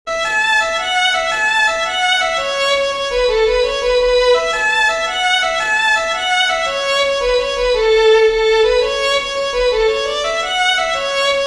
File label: jig